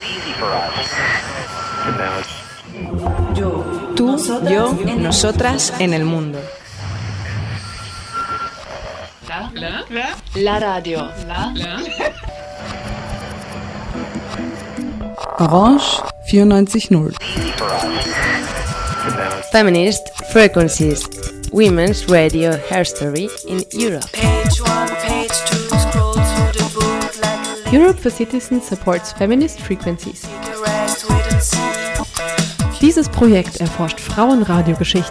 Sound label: jingle; jingle femin; LaRadio